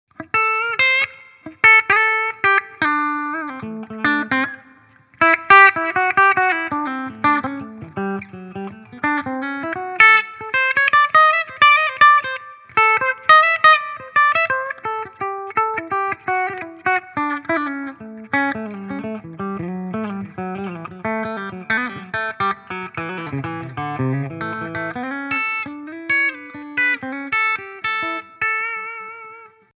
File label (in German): D´Angelico Deluxe clean Steg HB